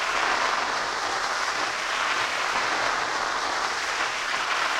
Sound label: Cracklature, sparse soundscape